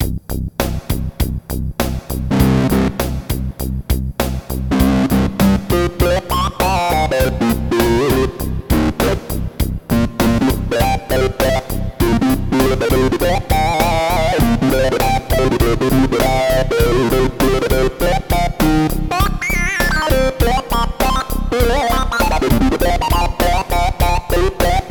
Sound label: stomping mini and memorymoog